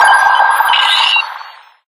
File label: quest status tick novice pda